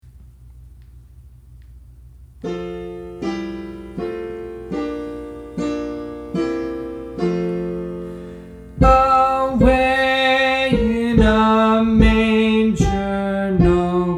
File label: Soprano